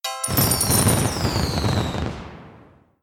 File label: general collect reveal; common bonus